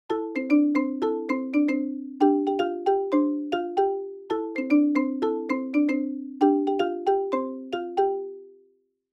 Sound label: Smartphone Ringtone Dry